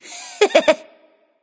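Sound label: heh heh, female; smite; voicepack; hera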